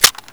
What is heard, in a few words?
clip out; weapons